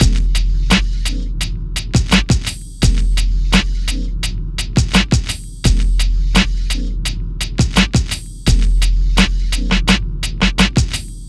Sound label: MIX, Hip Hop and R&B Drumloops